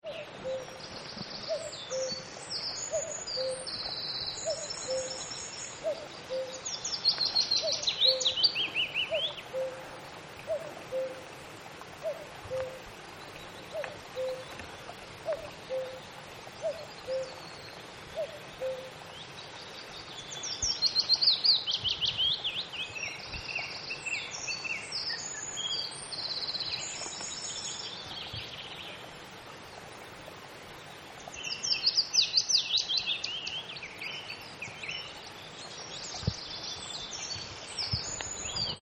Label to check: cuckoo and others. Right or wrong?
Right